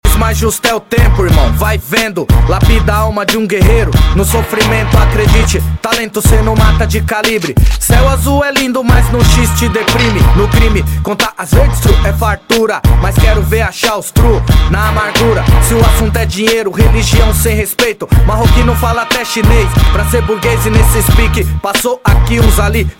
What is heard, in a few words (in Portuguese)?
Rap Nacional